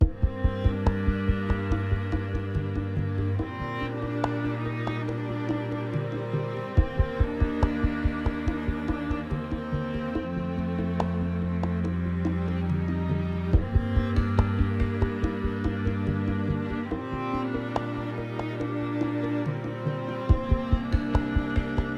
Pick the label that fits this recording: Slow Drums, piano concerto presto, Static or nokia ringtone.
Slow Drums